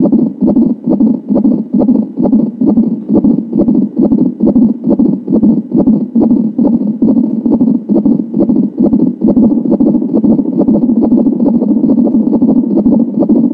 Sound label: baby heartbeat